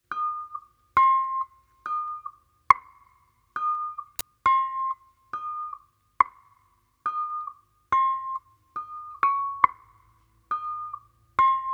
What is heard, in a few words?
keman; colours